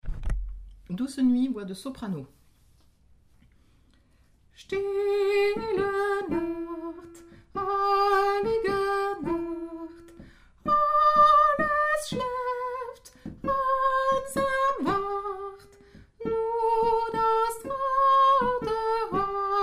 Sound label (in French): stille nacht soprano